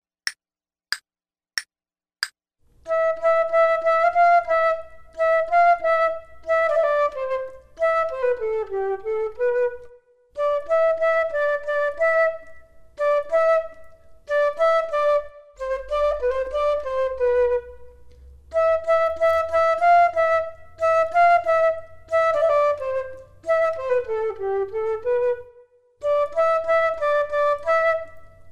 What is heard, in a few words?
Duets flute; Selected Duets for Flute Podcast